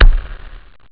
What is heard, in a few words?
B drum; jazz jackrabbit 2; M8